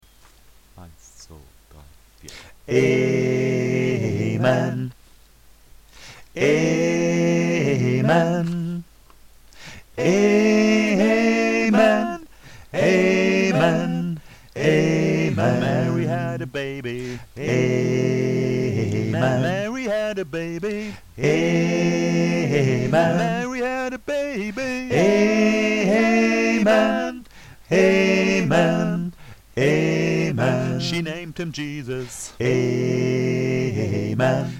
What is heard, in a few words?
Amen, gospelchor